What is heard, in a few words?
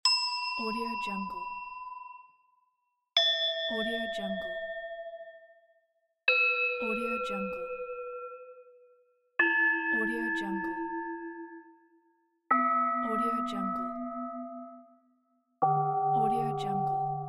tibetan meditation gong bell